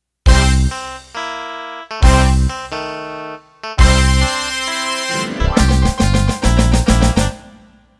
Shuffle Intro

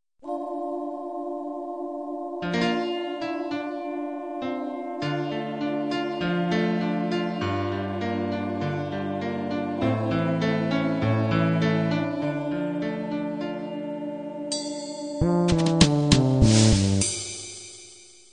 country-pop ballad intro

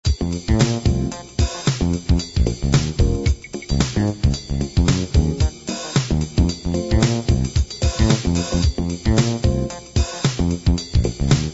jazz hiphop; Works for: SmoothJAZZ, JAZZ, FUNK, R&B, POP & CCM

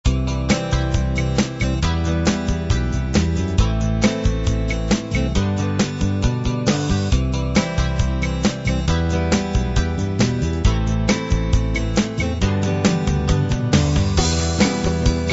light rock

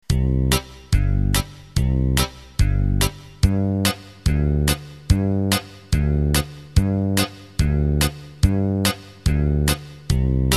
Broadway

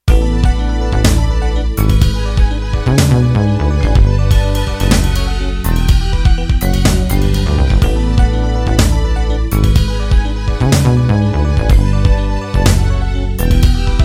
16beat

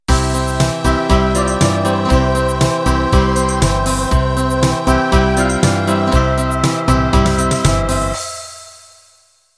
Schlager Intro

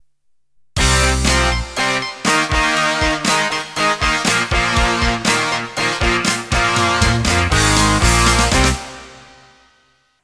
Open Rock Intro

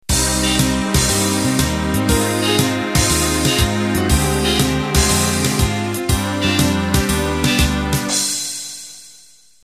Fox Trot Intro

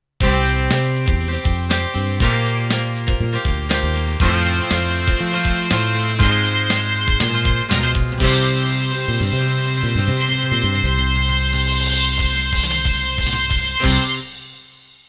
Fire Rock End

